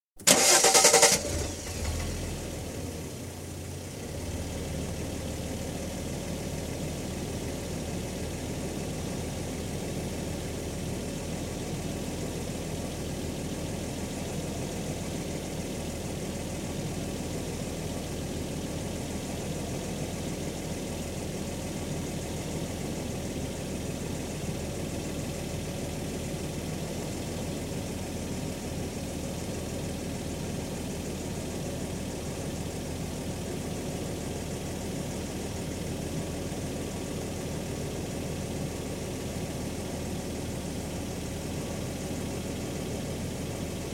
Jaguar Sovereign, exterior, switch on, idle, switch off; 3.6 litre, 1986/7 model; Car; BBC